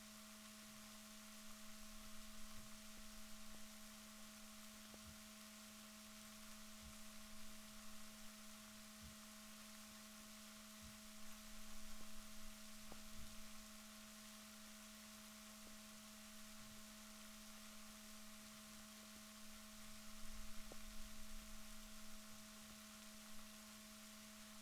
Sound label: rorquals positif, Balaenoptera Physalus Chile